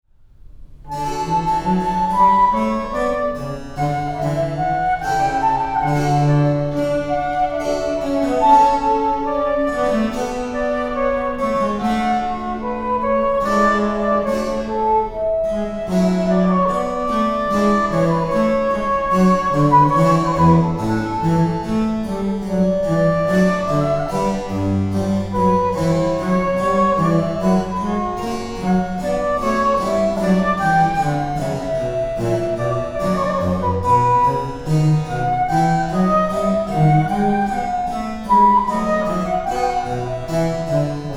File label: sonata in d for flute affettuoso